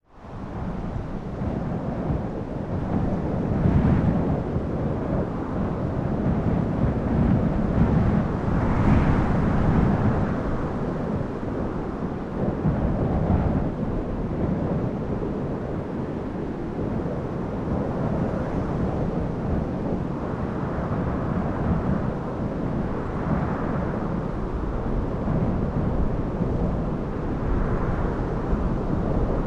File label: ambient wind; Marc Ecko's Getting Up: Contents Under Pressure; XBOX